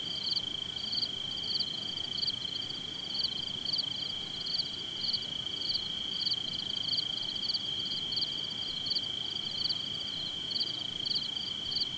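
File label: ambience night; de tower3d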